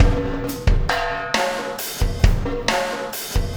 Impact Crater Beat, Processed Drumkits

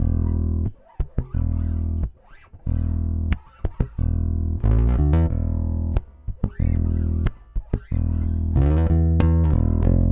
Rock, Bass; Bass X

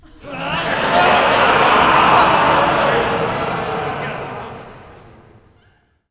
LAUGH CHOIR

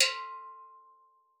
STEELDRUM C4; Xsample VOL-4

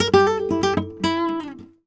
Flamenco Guitar Picado, f#; Best Service ProSamples vol.46 - Flamenco

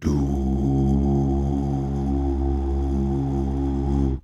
DUUH

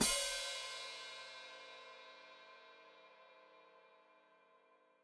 Hat Closed Open, Hi-Hat

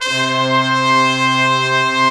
BIG BRASS; OMI - Universe of Sounds

